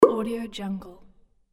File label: pop; bekibeats